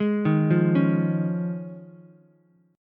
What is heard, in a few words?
Eb 7th Suspended 2nd Suspended 4th Ukulele, chord; Eb7sus2sus4; arpeggiated; 1,3,1,4; JGuitar